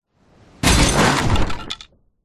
impact designed light whoosh into various junk objects crashing